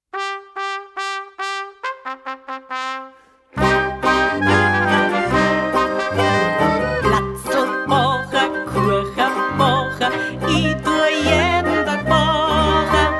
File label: alpen klezmer